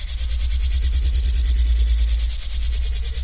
pistol idle, weapons

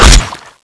lance slash hit

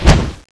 fly zombie fly start

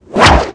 whip sword stab

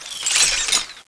void pistol shoot blackhole

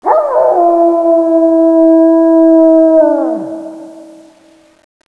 zombie dog howls

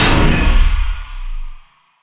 halogun end

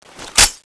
clip in, weapons; mgsm

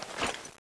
crossbow draw, weapons

